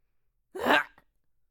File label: male death